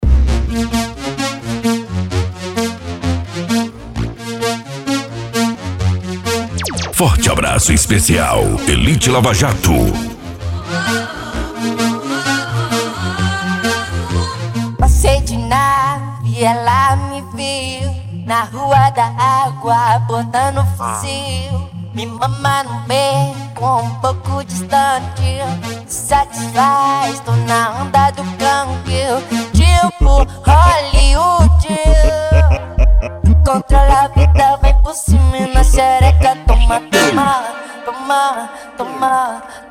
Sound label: funk mega bass